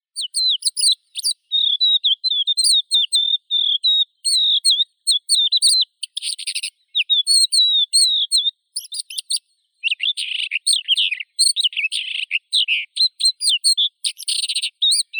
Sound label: zhavoronok hohlatii galerida cristata